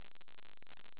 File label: silence; 16 bit